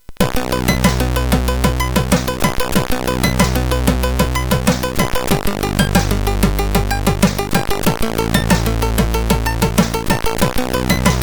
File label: sid CSG8580R5, GAMES; Dark Caves